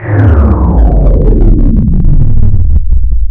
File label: Distort zoom